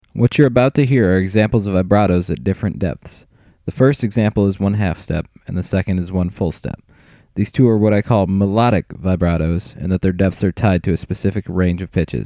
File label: Vibrato depths